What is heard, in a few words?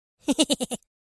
Hehehe; Minion